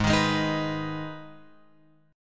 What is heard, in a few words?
Minor, Guitar, Open F; G#m; strummed; x,3,3,3,6,6